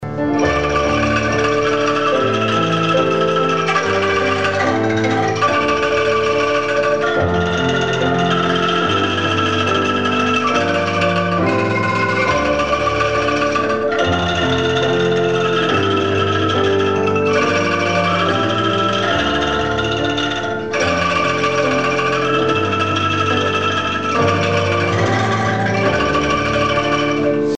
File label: anklung